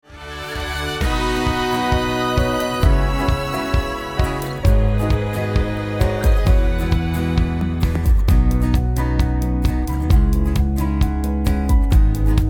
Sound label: Audition Accompaniment; freaky friday